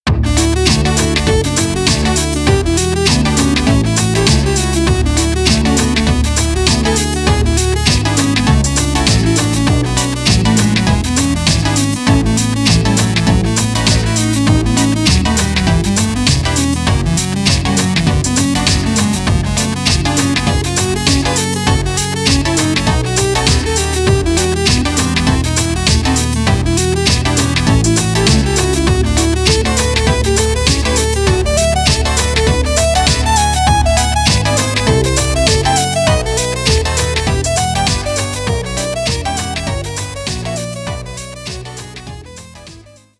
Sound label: prelude d major; bach; bwv 850